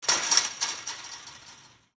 barbed wire move; gow; fortifications

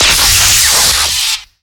create lightning bolt

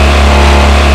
4banger mid

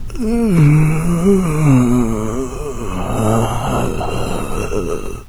zombie idle; doom3

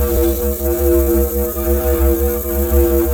laser loop

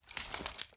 mg42 bullet chain; weapons

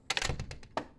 door close

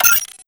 cockpit

